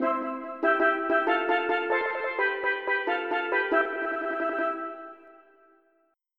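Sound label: steel drums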